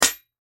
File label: Bullet Shell; Bullet Shell 223 Drop Metal Surface; Drops (Sound Effects:Objects); Blastwave; Warfare